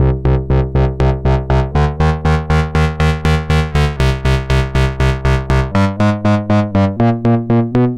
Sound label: C64 Bass, Commodore 64, Chiptune